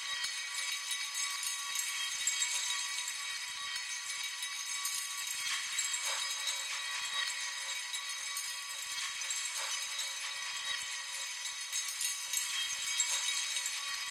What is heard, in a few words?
Chair Glitch; Game rip; MiSide